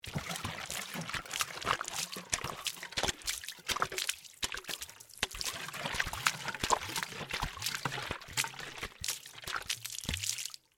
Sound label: Water Vinegar Bottle, Empty, Long, Gurgle; Splashes